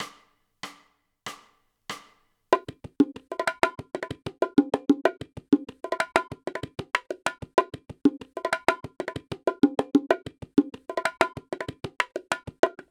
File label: Bongos